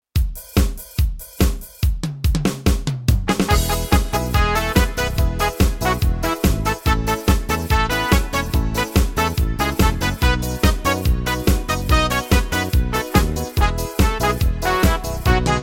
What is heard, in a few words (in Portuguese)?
ritmos yamaha marcha gaucha